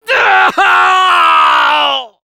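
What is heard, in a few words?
pain critical death, fr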